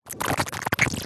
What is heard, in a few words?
glitch rapid modulation; silverplatter audio